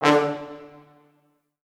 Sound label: D2 R, Orchestral Brass